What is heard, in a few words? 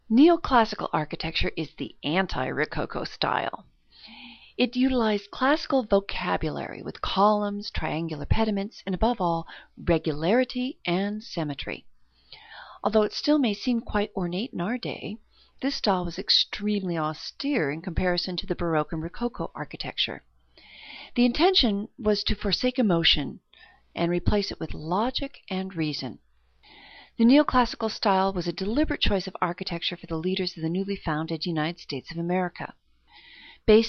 neoclassical style